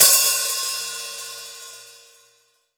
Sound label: Hat open sizz; Akai MPC500; Hats TKit